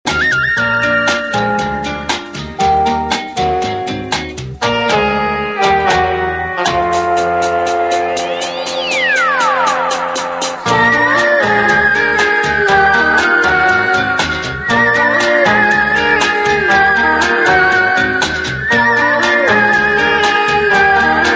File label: Fuzzy; Motorola Q9c